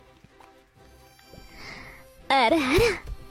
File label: lullaby ara ara